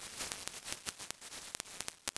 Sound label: noise